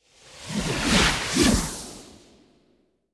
Am thanh tien lam phep

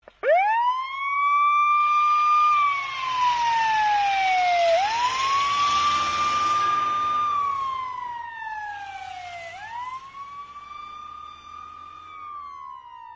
Tieng xe cuu hoa chay tren duong

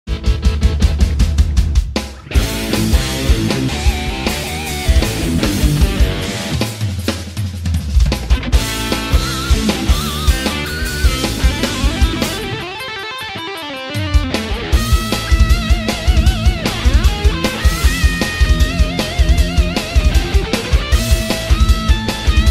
Nhac chao mo man chuong trinh the loai rock

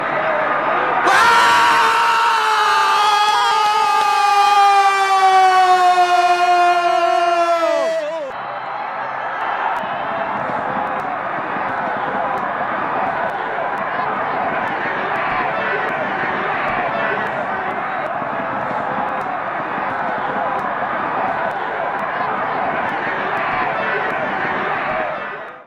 Am thanh sut vao da bong

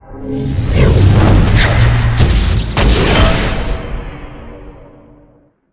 Tieng phong dien va no

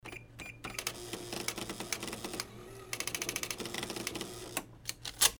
Tieng may dem tien

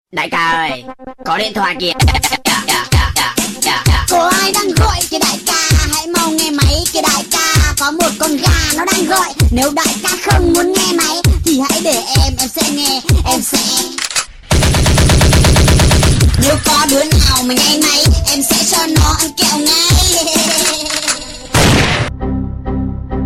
nhac chuong dai ca oi co dien thoai kia tieng sung

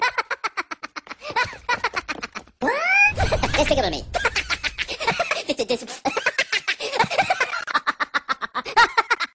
Tieng cuoi minion hai huoc de thuong

